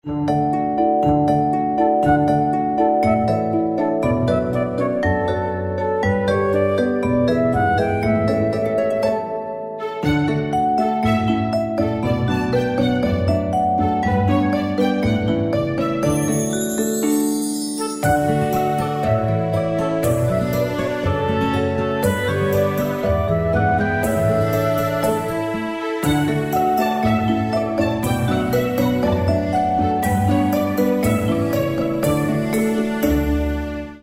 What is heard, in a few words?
back music; pacman; 小花寶吃糖果